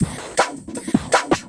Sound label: Loop, Drum & Bass, 160 BPM